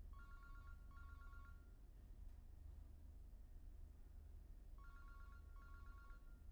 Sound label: phone; IEEE AASP